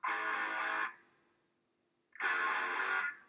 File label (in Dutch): Muziekfragment Rock